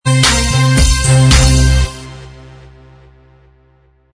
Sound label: tv theme Inst; Nickelodeon